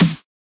melbourne bounce snare small; Hip Hop; STYLE KITS; D3ron Melbourne Bounce Kit

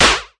Yamaha TX-81Z SD; drum machines

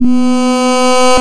hmm, synth

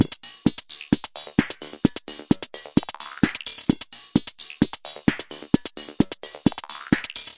analog berlin, drum loops and breaks

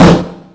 Slam SN, Dirty Hip Hop Kit; style kits

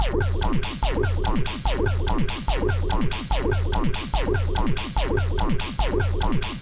145 wobbly, wobble techno; remixable collections; alyce wunderland

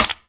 fartysnap; Weird and Interesting Hits

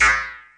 cyborg Twanger; UNUSUAL KITS and FX

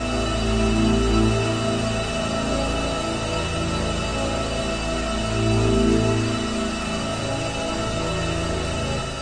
bladerunnery pad; Pads

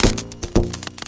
2 beat bendy bass; BASS LOOPS

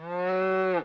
moo distressed, cow; Cow Construction Kit

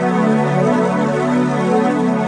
thick 7th synth loop; Pads

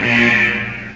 Electro FX; Ueb HE Electro FX

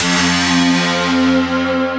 Synthesizer Middle C Notes, Lead

